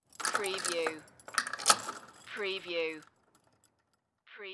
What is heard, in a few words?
Chain Hang On Wall; sound effects bible